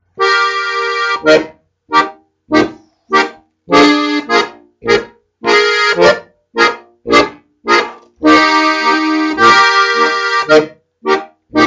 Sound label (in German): Polka